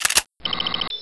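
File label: plasma reload; plasmagun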